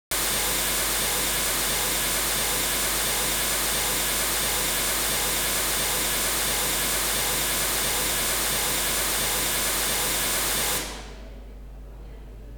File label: MLS recorded